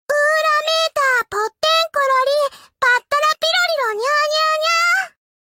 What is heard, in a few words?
chant